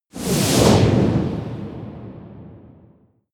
promo pad; whooshes rises

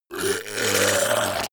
zombie grunt choke male; kreaturen

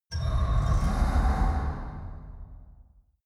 metal low rattle fast

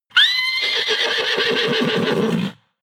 horse neigh agitated; natur-tiere; pferde

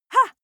female shout attack short ha soft

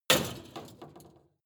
metal impact scrap metal bike; hits explosionen; metall

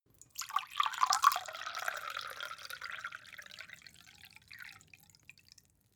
glass pour soft; soundpool

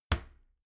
door wood close soft

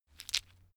stone gravel clatter short; natur-tiere; steine

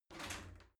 shower slide door slide short open

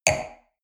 wine glass place table medium short; berufe; soundpool

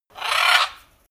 bird screecher; natur-tiere; voegel

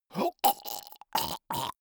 monster choking zombie short; koerpergeraeusche; comedy-cartoon; soundpool

